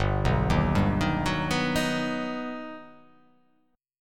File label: G Augmented Major 7th